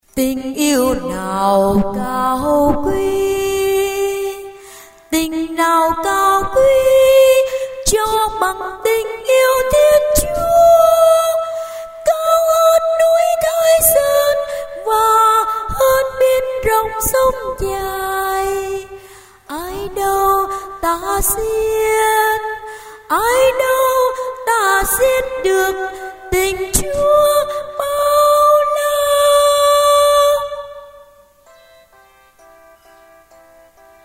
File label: tenor